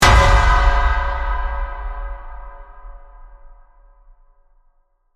Hit Metallic Impact, Reverb, Rumble; Production, Element, Imaging, Accent, Transition

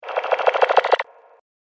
Liquid Alien Attacker, alien sound effect; science fiction sound effect

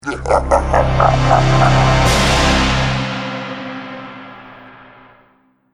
Trailer Hit Monster; Trailer Sound Effects

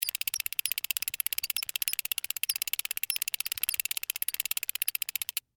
Fishing Rod, Reel, Clicks; Sports; Fishing Sound Effects; BlastWave

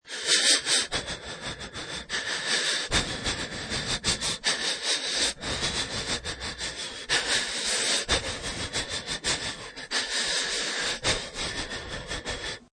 Scared Panicky Breathing Moderate Speed; Human, scared breathing, panic, nervous or out of breath; Scary Breathing Sound Effects

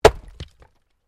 Wood Chopping Axe

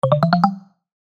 Chime Musical, Chime Musical Multimedia; Web Interactive; Chimes; Blastwave

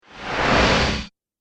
Laser Whoosh; Whoosh Sound Effects

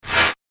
Industrial Swoosh; Whoosh Sound Effects

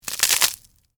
Crunch Pretzel Stick; Texture; Crunches; Objects; BlastWave

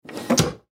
Foosball Table; Foosball Table Players Double Move Hard

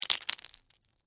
Texture, Debris, Waffle, Cones; Debris (Sound Effects:Materials); SoundEffects-BlastWave

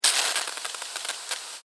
Household Pesticide Can Spray; Yard Tool Sound Effects; Yard Tools (Sound Effects:Tools)

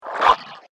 Alien Dog Creature; Alien Sound Effects; science fiction sound effect; Andrew Fleming; Twistedtracks

